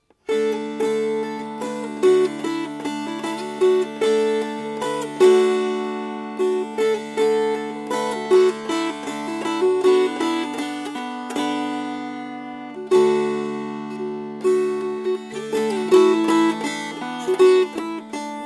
Strummed

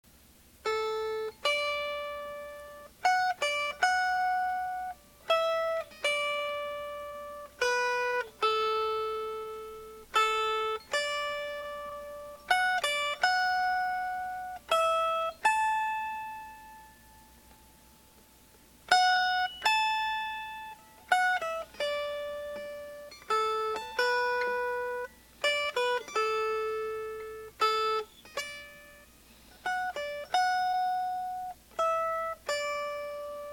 Melody

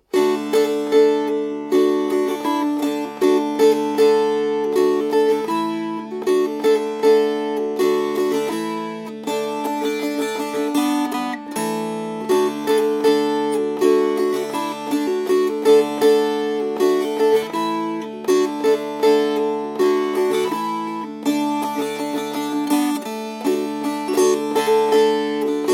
Strummed; Johnson Boys

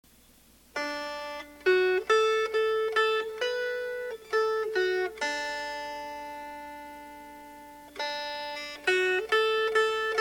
Melody

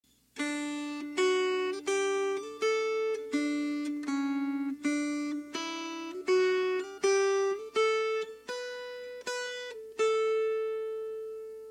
Melody; How Are Thy Servants Blest, O Lord!

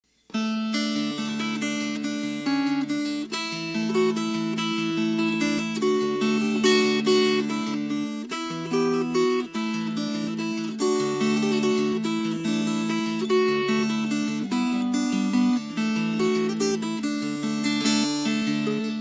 Fingerpicked; Kathleen O'Moore

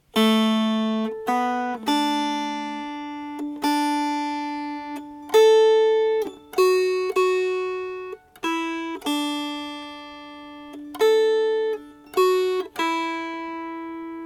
Melody; Mingulay Boat Song

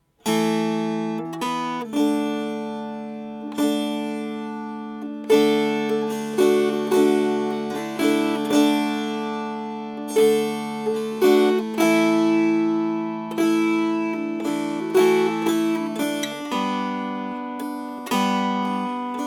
Strummed; Mingulay Boat Song

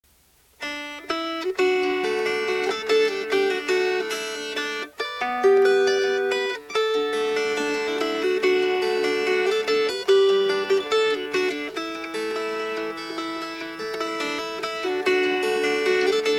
Fingerpicked; Leaving of Liverpool

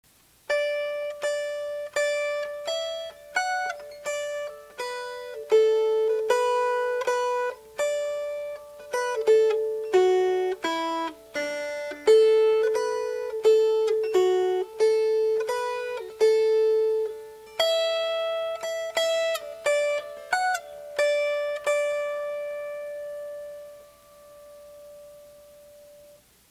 Melody

